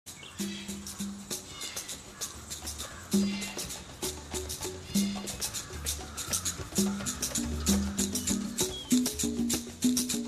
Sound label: Laughing Drums